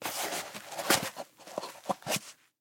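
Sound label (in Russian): шуршащая подпись на старом пергаменте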